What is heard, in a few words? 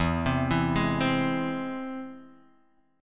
E Augmented E; E+ arpeggiated; JGuitar